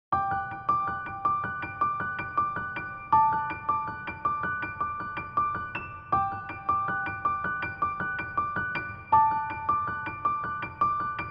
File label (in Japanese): piano wo hiku; natsukusa